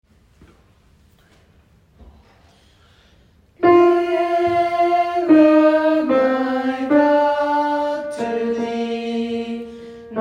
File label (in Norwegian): alt, gospel; nearer my god; kongsberg; kor; gospel for alle